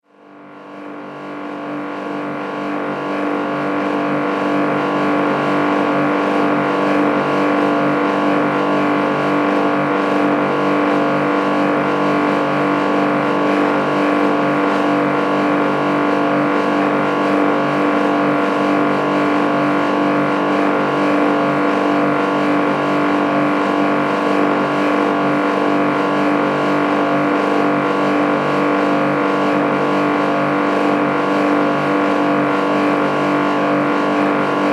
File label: Noisy Dehumidifier, basement; Foley Sfx